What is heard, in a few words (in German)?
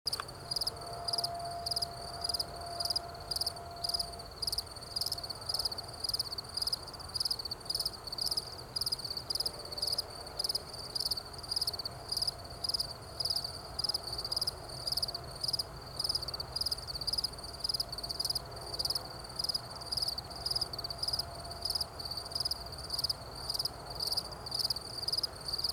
Feldgrillen; Roederaue Medingen